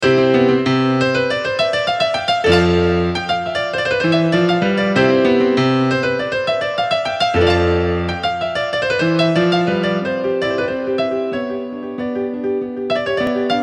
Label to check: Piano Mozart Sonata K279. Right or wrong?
Right